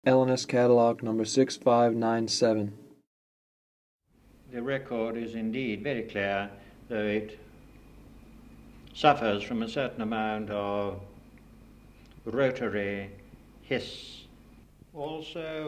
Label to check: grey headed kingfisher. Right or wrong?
Right